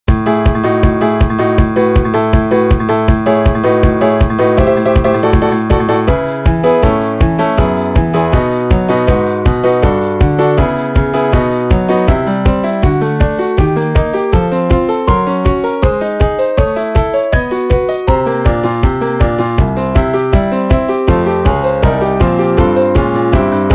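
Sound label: piano with drum machine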